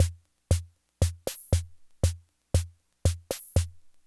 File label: Loop, classic machines, rhythm77